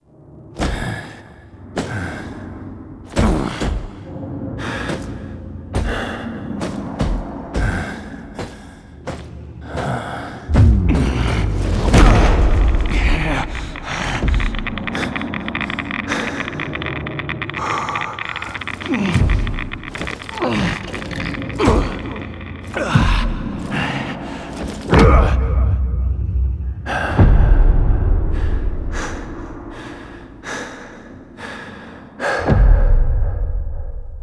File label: Last Stand Cinematic SFX; Warframe; NineteenNinetyNine; Stage12PartyOfALifetime